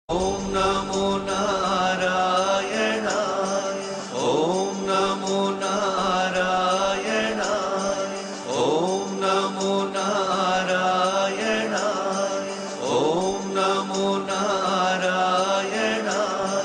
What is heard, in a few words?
om namo narayan shiva ringtone; Navratri Special